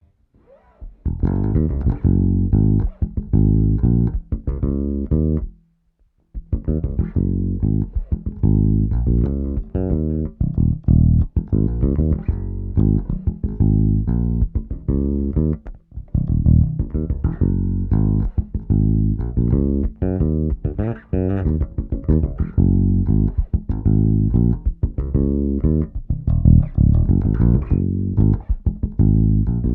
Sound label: Roasted Ash Bridge Pickup; Burmese Sal; Viceroy; fodera